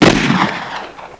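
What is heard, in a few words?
BIG PISTOL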